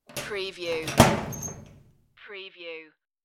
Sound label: close locker door